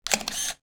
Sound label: camera click